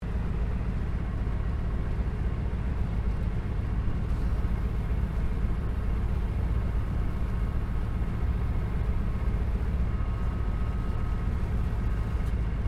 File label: AIRCRAFT CA-13 BOOMERANG TAXI INTERIOR; Airplanes; Vehicles; Airplane Sound Effects